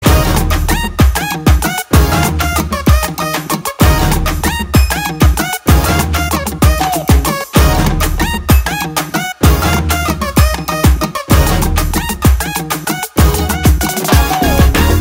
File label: Love Bgm Tone